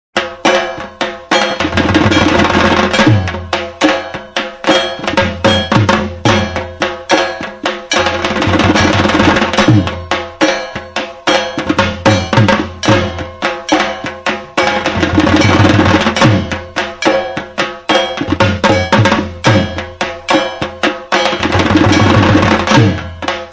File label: Saptami Dhak Music